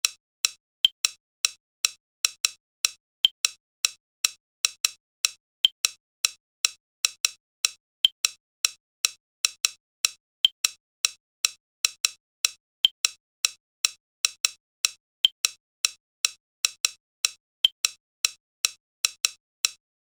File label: Tick Phase, Rhythm